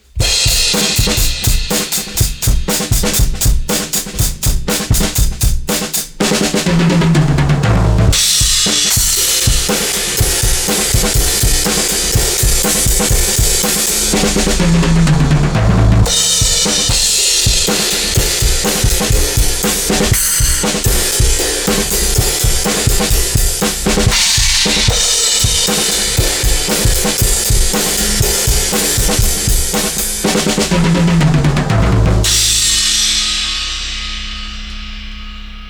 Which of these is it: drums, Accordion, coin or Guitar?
drums